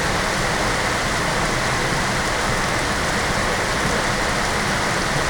rainy atmo

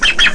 pup; Zachary's Zoo

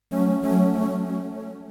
wings, 140bpm keyboard; hous-tec

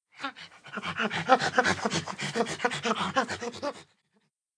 monkey harvest

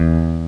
Guit (Jazz) E1; Patches; X-Wing

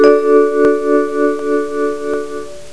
bell; Chimes Bells

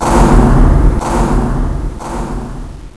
rapidout; X-men

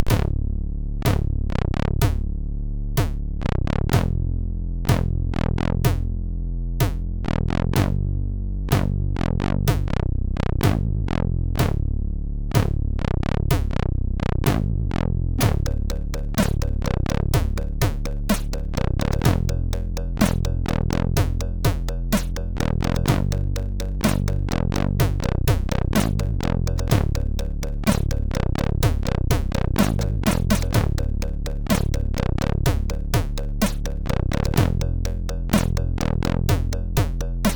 beat; SIDTunes

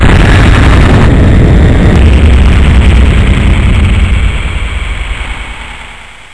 volcano; explorer

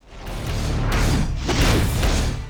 Critical Stop; Lara Croft- Tomb Raider- The Cradle of Life

